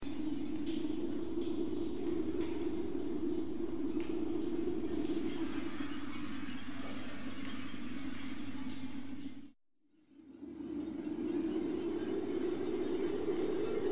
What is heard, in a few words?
ambiance crystal; Otems Defiance